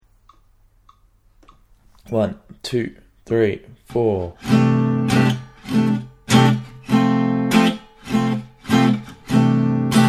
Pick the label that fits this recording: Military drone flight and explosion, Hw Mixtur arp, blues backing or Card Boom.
blues backing